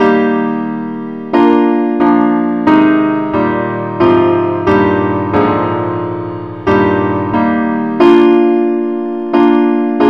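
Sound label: advance Accompaniment key E flat; Gwalchmai; Glory be to God on high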